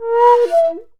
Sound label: SHAKU FALL; World Combo